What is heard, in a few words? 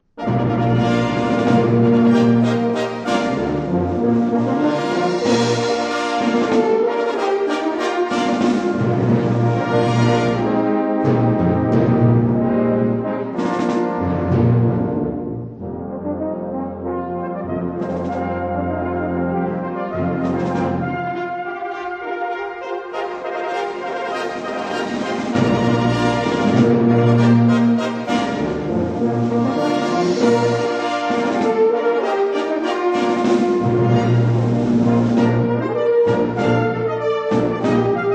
Fanfare